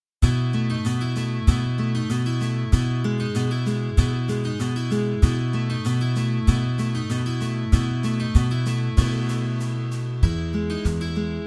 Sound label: guitar bass drums sound fx; anotherroutineday